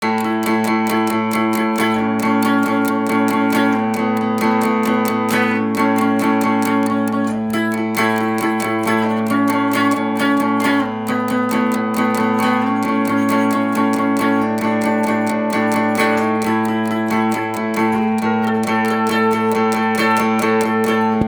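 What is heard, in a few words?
Double Stop Riff, guitar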